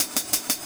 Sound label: Cymbol Particle; Particles